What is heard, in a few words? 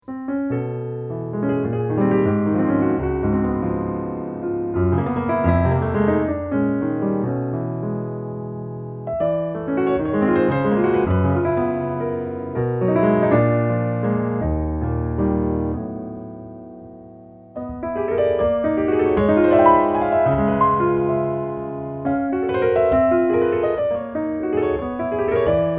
Waltz; Waltz of the Enormous Wizard Zendor